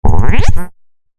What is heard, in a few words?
synth space weird robot speak accent